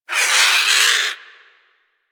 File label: Avichaea Vocal Death; Warframe; Hunting Critters